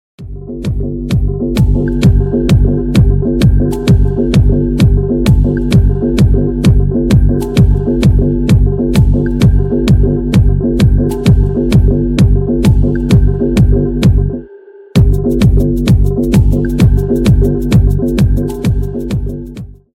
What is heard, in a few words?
Tribal Dance